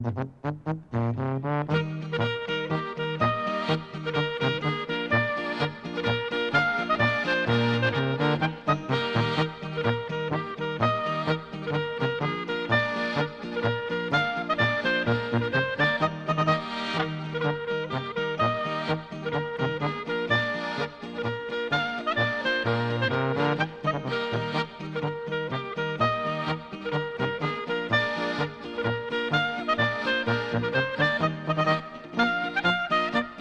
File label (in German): wildschwein polka